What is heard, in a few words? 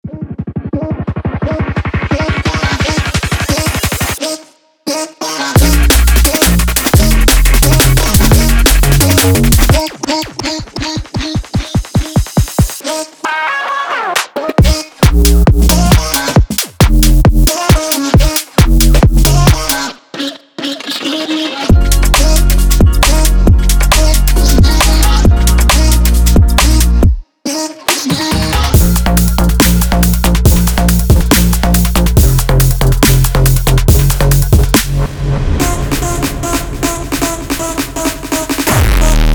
Drum Essentials; Black Octopus Sound; Dawdio